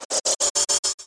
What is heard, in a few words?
Teleport; allods2d